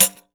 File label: 10 PANDE, PANDEIRO